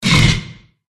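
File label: wyvern wound; viverna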